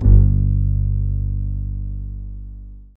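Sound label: acoustic bass G2; Greytsounds - Scott Peers Sound Engineering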